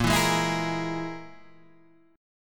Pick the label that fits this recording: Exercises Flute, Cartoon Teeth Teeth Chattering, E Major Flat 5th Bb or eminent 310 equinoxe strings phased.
E Major Flat 5th Bb